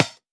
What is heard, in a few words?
Open Hihat; Vengeance Samples; VEE Cymbals